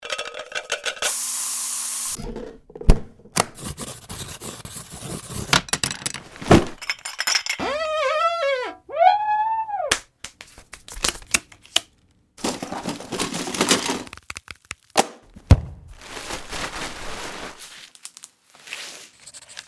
Household Foley; Big Room Sound

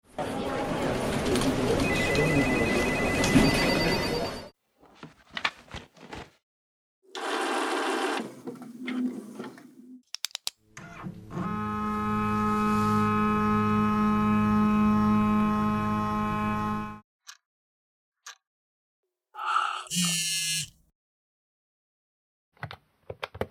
Workplace SFX; Field And Foley

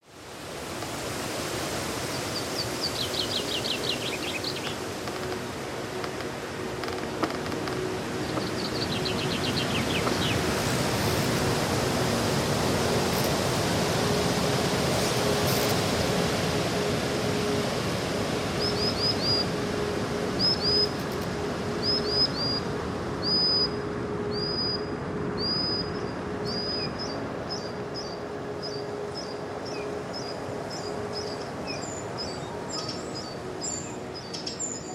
Forests of Norway; Stereo; JSE

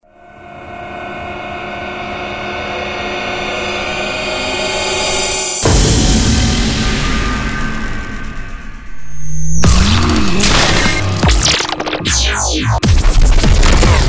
Robotix; Twisted Tools

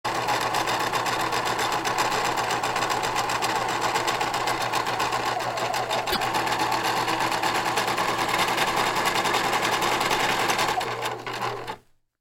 Around the House; Blastwave FX

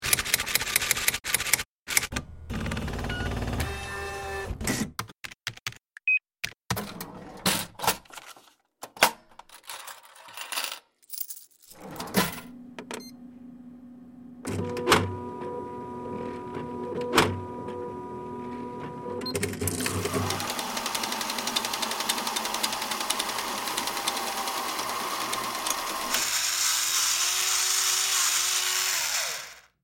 Office Equipment; Big Room Sound

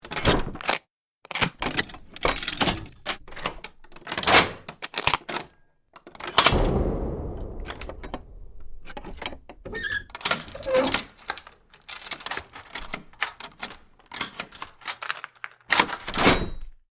Locks And Latches; Big Room Sound

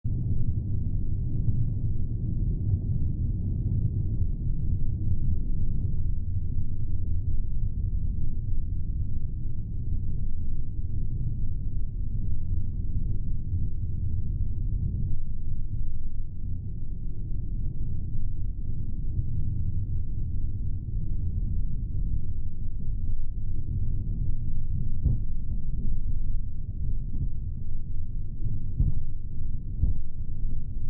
Essential Textures; Big Room Sound

